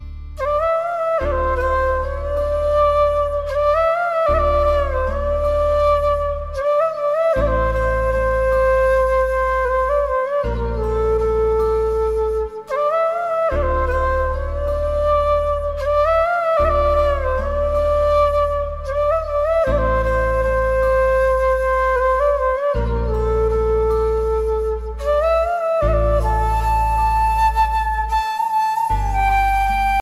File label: DJ FLUTE